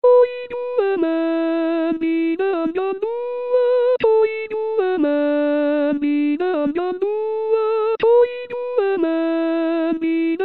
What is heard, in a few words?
soprano